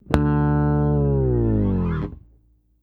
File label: FALL D2, Bass